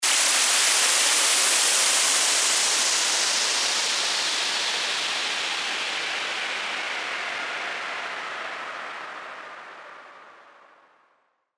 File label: WHOOSH